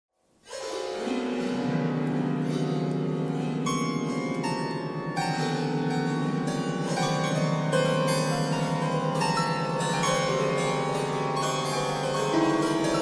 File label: piano thread noise all